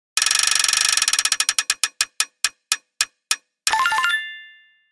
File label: wheel of luck